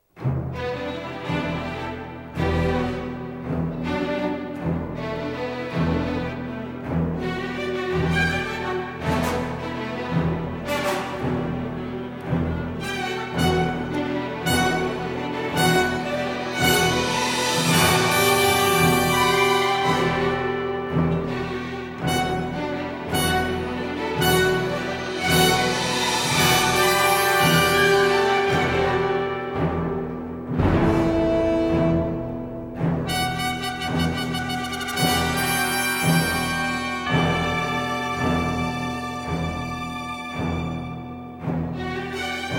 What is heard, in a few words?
Orch; Lethal Weapon